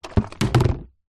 food drink smoothy bottle knock over on surface; zapsplat

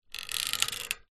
metal bendy pole light stretch bend creak; foley

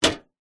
household mailbox metal close; zapsplat

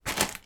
household pack of 2 toilet rolls pick up

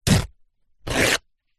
paper towel kitchen roll rip; zapsplat; foley

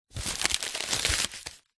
foley balloon foil small inflated handling

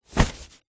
foley tent in bag large heavy set down; zapsplat

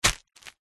foley footstep single on plastic sheet

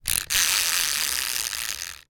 toy plastic wind up release run; zapsplat

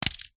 foley wasp nest section hit ground

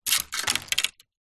leisure fishing road drop ground; zapsplat

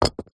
foley brick set down ground; zapsplat

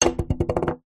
household coaster clay set down on glass table

